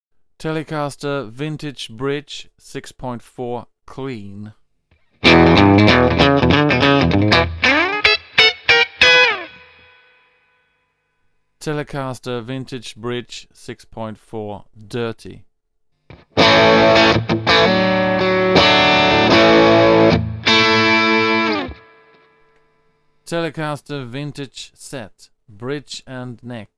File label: Tele Bridge 64